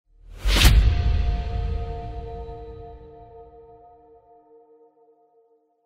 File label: impact